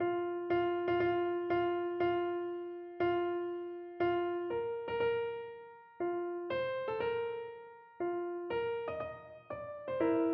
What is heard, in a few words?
wagner wedding march, piano